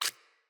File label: Root Spider Step Water, Foley; Animals